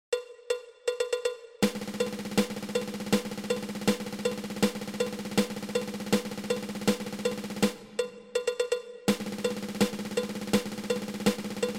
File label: Eleven Stroke Roll